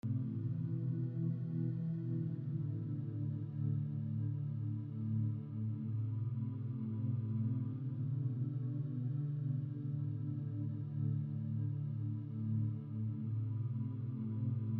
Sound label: Loop